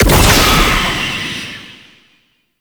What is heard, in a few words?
Unhallowed Curse Hit; Warframe; Dagath